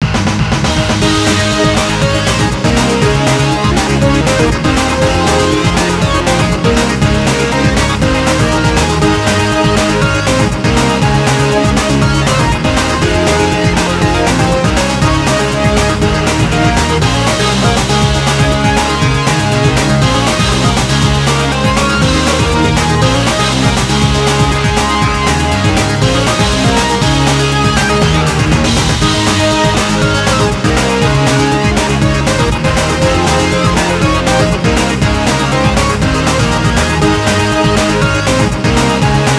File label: bgm; Dragon Ball Heroes Ultimate Mission X; 3DS